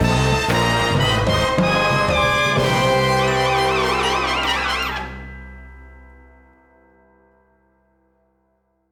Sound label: Crimewave Complete Sting; lego the incredibles 2018 switch